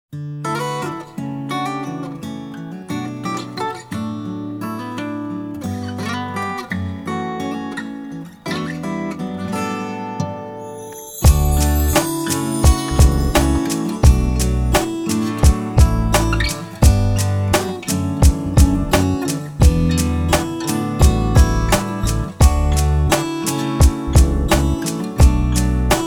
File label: Full Off Vocal Vers; JPN; Aria the Animation